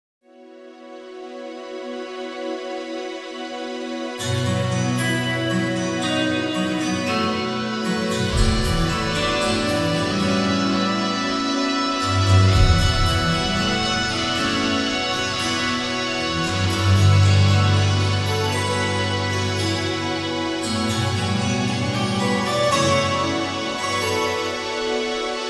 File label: Guitar Shimmer; Ready or Not; Supporter OST